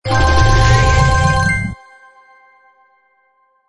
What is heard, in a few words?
arrow up